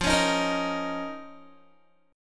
F 7th Suspended 4th Sharp 5th F down Guitar

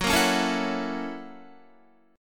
Gb 7th Sharp 9th Gb Guitar; strummed; Gb7#9; JGuitar

